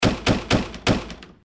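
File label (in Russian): кулаками в дверь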